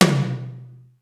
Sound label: md cannon; sr16 tom